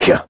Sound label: melee; Crypt of the NecroDancer